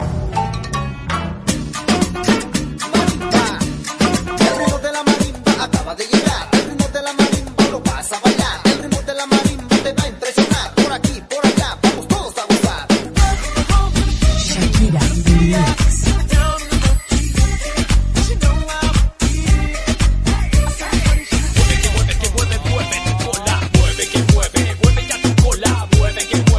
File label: marimba, ringtone